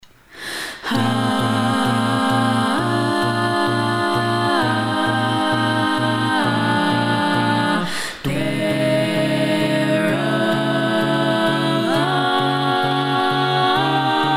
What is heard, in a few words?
Tenor